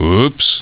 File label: Oops